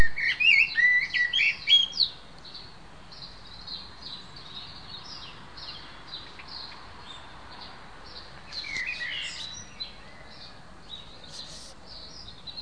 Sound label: blackbird singing very near